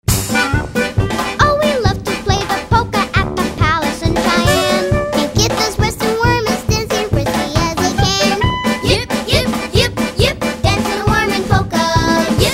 Tuba Polka; Nick Jr Radio

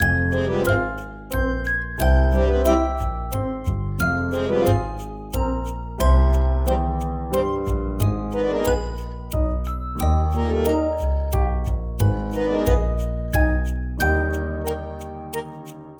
Baby room theme; Toy Odyssey The Lost and Found

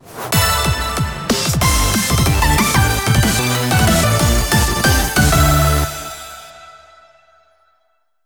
Stage Clear!

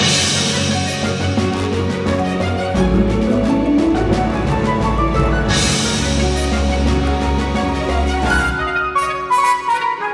music invincibility loop; the smurfs 2; xbox 360

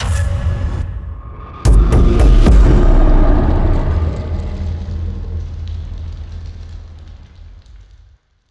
Generic champion death

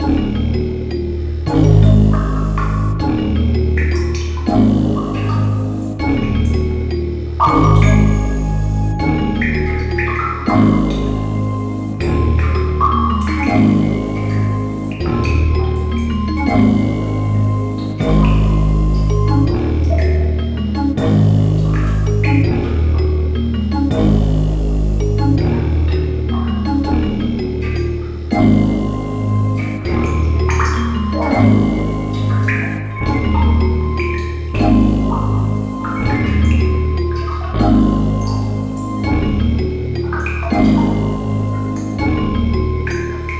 Stage BGM; hichi and friends

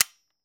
tools dry fire; teardown; ps5 windows xbox series xs

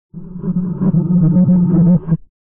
laugh; golden freddy; Five Nights at Ronald's

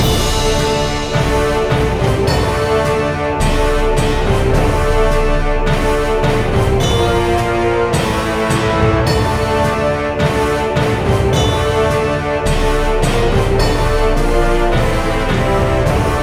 Level Complete; ziggurat; Wii U

